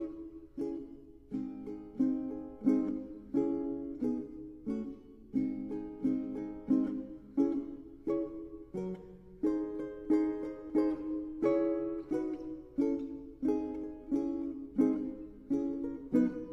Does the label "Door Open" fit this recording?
No